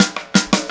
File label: Static Beat Intro; Sonic Foundry (Sony Creative Software); Indie Rock Anthems; Session 13 - Static